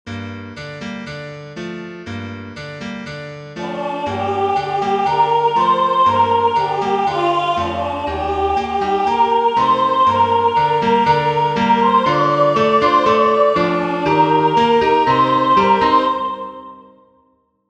piano

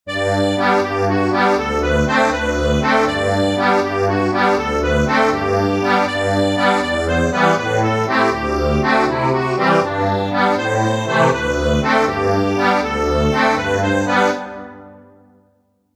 accordion, sheet music; Bring a torch Jeanette Isabella

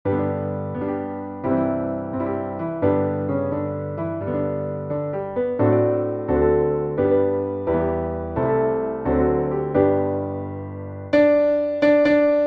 PIANO

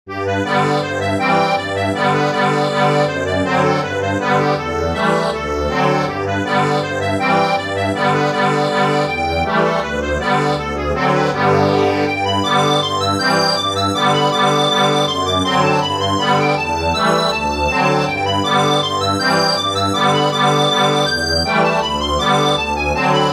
Lancers accordion; The Covent Garden

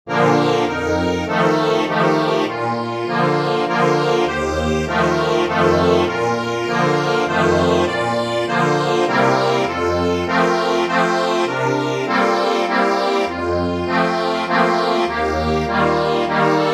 ACCORDION

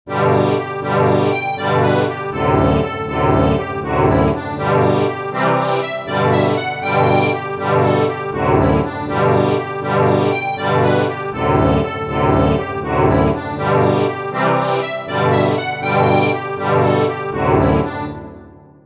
accordion

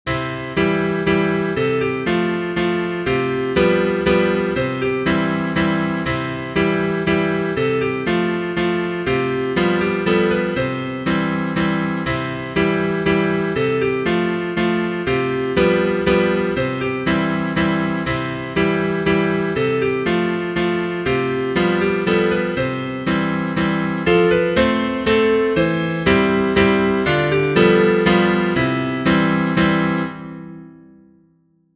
Swedish dance, piano

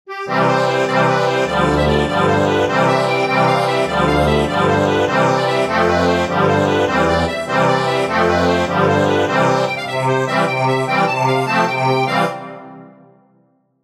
accordion

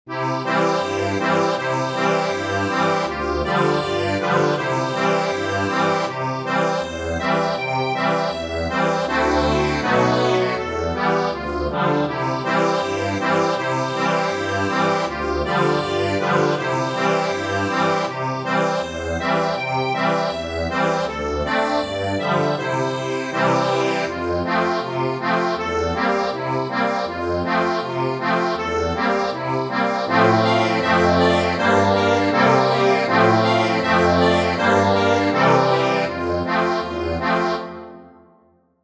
accordion

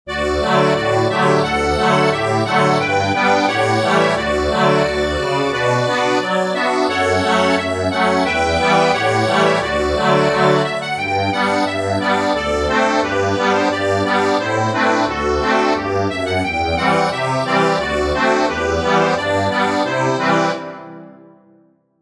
accordion

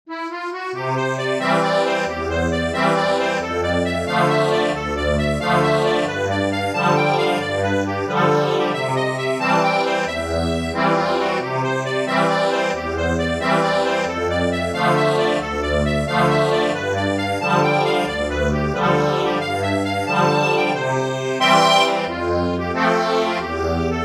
The Bantams, accordion

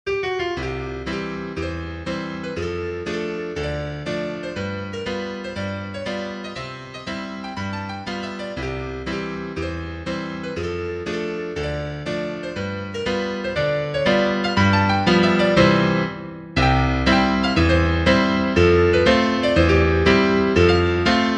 piano

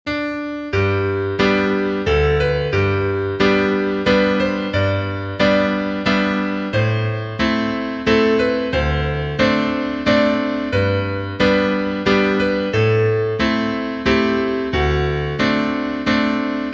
cradle song; Away in a manger

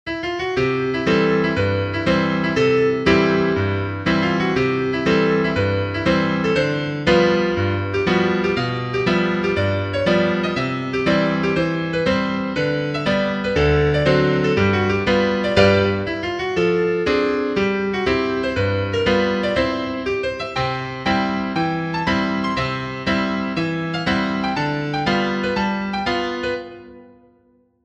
piano

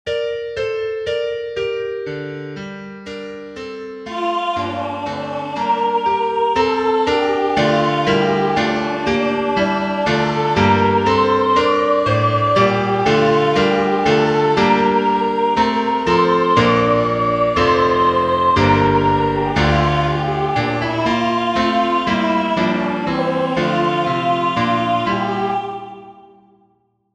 piano

